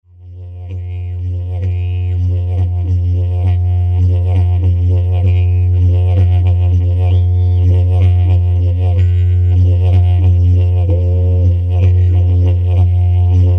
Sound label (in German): Didgebox aus Leder, F#; kleine Didgebox aus Leder